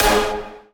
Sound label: punch G#5